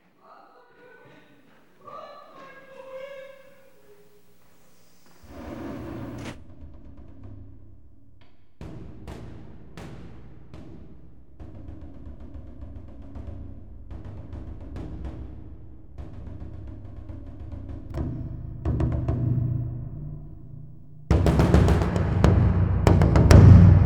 Percus; Troy

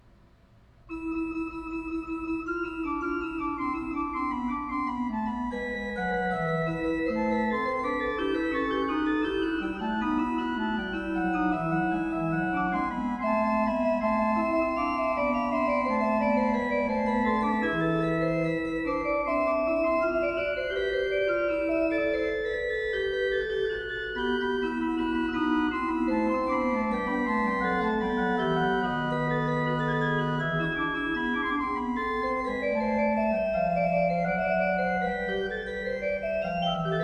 Fugue, Organ; Magnificat quarti toni; Johann Pachelbel; Complete Organ Works

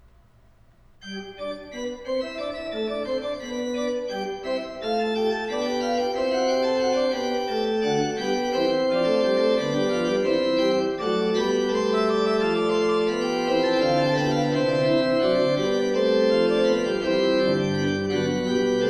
Fugue in G Major, Organ; Johann Pachelbel; Complete Organ Works

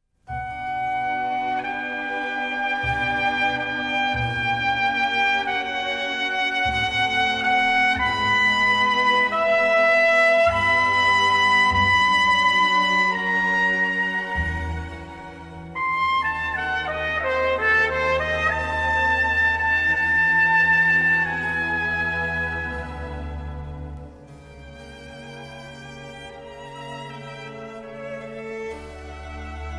Trumpet Concerto in D Major, Largo; Baroque Trumpet Music

